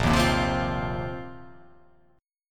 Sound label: Bb Suspended 2nd Suspended 4th, Bbsus2sus4 chord; strummed